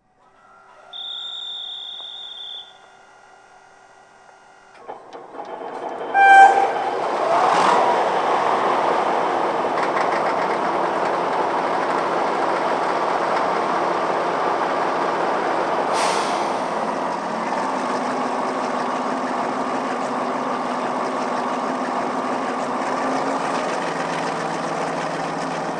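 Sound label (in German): Hörprobe BR216 Vorserie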